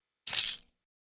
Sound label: bottlecaps; fallout 4